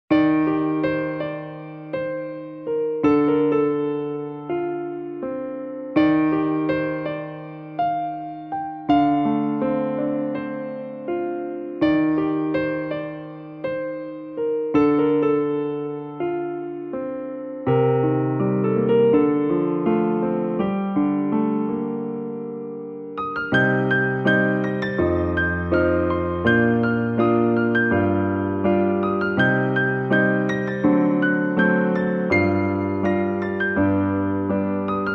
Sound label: piano; maoudamashii